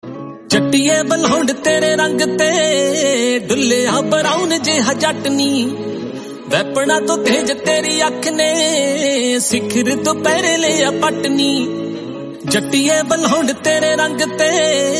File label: punjabi song